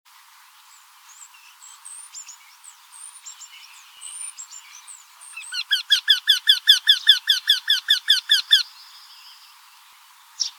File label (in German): Wendehals; Ornithologie